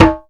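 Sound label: Ethnic Perc, drum; Percussion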